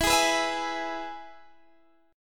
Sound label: E Major